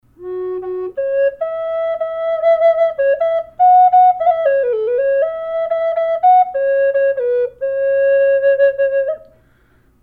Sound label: f sharp, flute; collector flutes